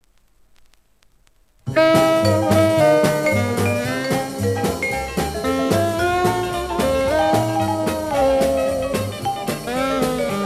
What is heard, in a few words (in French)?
sequense de jazz